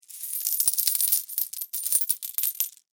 Foley Coins Count In Hand Movement; Money (Sound Effects:Objects)

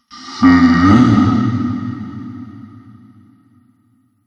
Zombie Huh; Zomby; horror

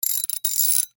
Industry Ratchet Turn Loosen, hand tool; Hand Tools

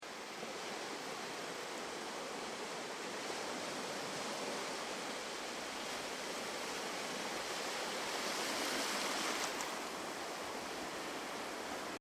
Ocean Beach Sound Of The Sea Surging Billows; Ocean - Beach - Sound Of The Sea - Surging Billows 6; CraftedSignals

